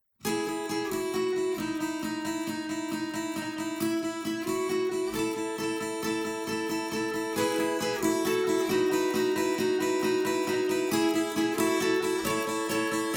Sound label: wave acoustic